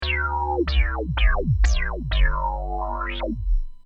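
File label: reso roland, synth; jx8p; moogulator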